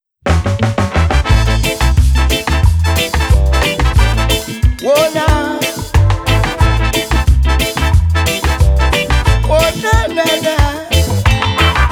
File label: Groovy Feeling